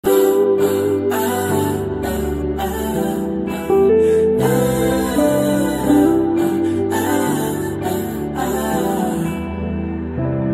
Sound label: Acoustic